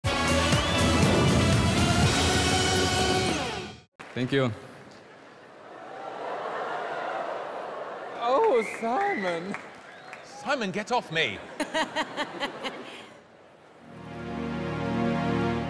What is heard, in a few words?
electro techno dance; light balance; britain s got talent 2014